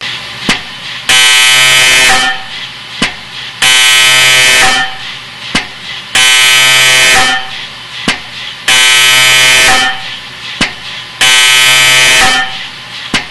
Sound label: scanner noise